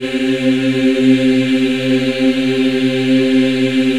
C3, Choir; USB Soundscan vol.28; Acoustic & Synth; AKAI

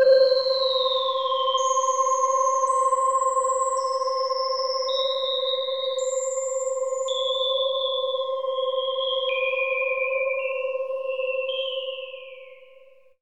space atmos; AMG - Vince Clarke Lucky Bastard

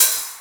KOMMERZDRUMS

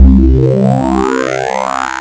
NASAL; SONIC ELEMENT

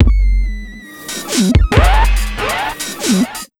Big Beat Drumloops, SPEED 140

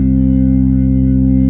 B3 C 3-3, ORGAN

